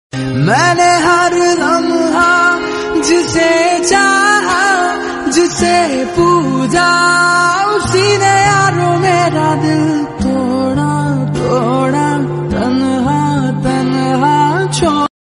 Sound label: sad ringtone